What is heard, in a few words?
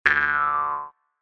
boing